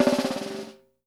BUZZ, SNARES; Sampleheads; New York City Drumworks VOL-1

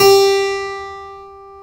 KEY HRPCR; Roland - LA Composer vol. 1

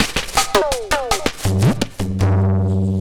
Pure Tabla, 080BPM; Zero-G

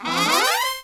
SECT BRASS FX

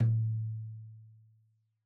Tom2 Stereo, Deeply Sampled Drums

